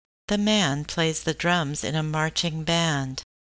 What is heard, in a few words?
drum